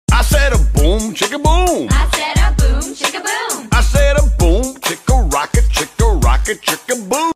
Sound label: i said a boom chicka boom